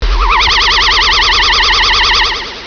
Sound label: FUTURIST